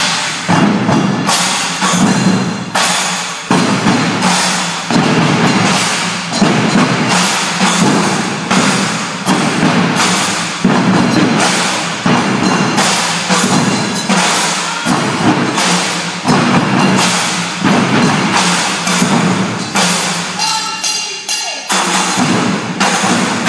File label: the drums